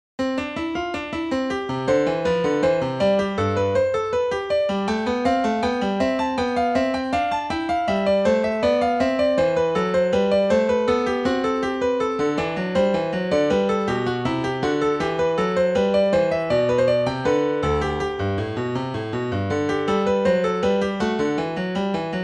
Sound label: invention just intonation, piano